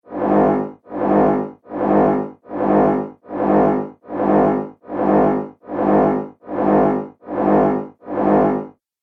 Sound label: Laser Machine Pulse; Laser Sound